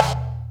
snare fx; Breaks N Beats Sound Kit; Beatz Kit

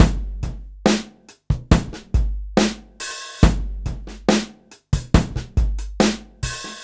Slomo Beat 140 BPM, Loops, Slow Motion Beats

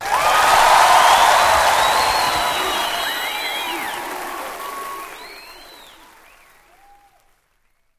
Crowds; Vengeance Samples; VEC2 FX

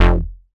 Synth Bass; Vengeance Samples; VEE Synth Shots

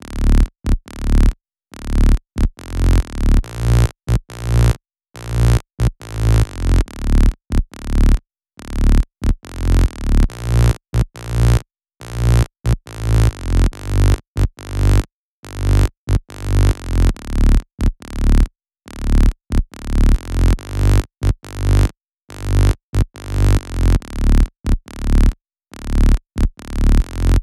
Bassline 140BPM, Dminor; Bassline & Melody; Vengeance Samples; Beast Kit

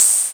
Cymbals HH Open; Vengeance Samples

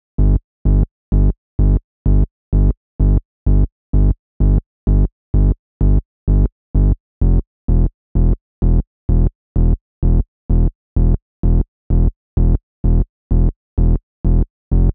128BPM Bass Root G, TechHouse; Averna; Vengeance Samples; Bonus TechHouse Kits

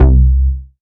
Bass C, Offbeat Bass

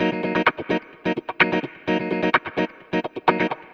Electric Guitar Kit D# min, 128BPM; Vengeance Samples; Special Sounds

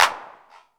Claps; Vengeance Samples

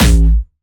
A1, Jumpstyle Kick; Bassdrums; Jumpstyle Multis; vengeance essential club sounds-3; VEC3 Bassdrums

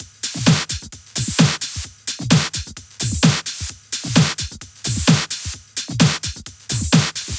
130BPM Organity Kit; Vengeance Samples

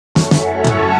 Fill Ins 128BPM; Vengeance Samples; VEH2 Loops

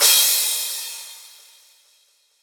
Crash, Cymbals; Vengeance Samples; VEH2

